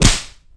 bitch slap; Tony Hawk's Project 8; XBOX

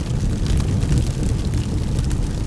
fire medium

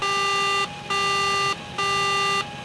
alarm; Star Wars Knights Of The Old Republic II; XBOX

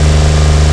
6 cylinder engine loop; auto; Tony Hawk's Project 8; XBOX

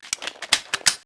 reload ingram; weapons; Max Payne

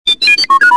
talk, r5d2

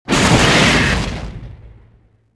alt explode, repeater; weapons

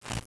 spark; Star Wars Jedi Knight II Jedi Outcast; XBOX

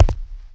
Walk Ceramic; Footsteps

